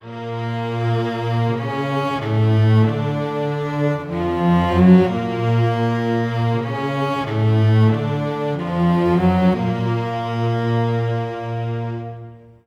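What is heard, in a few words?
Bb minor, Low Strings, 95; PowerFX - Downtown Orchestra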